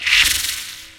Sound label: projectile launch; Weaver